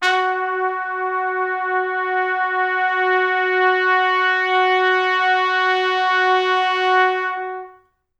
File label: F#3 -R, Pop Brass; Best Service; ProSamples vol.19; AKAI